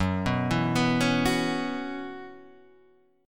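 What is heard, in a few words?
F sharp 7th Suspended 4th, chord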